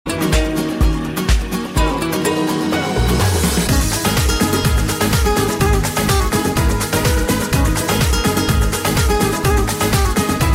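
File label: guitar rhythm ringtone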